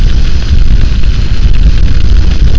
flame

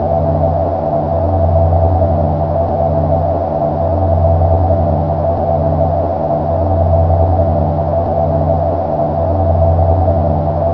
flush ambience

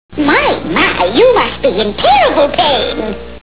granny pain

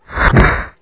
kick

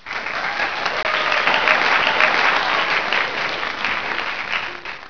applause

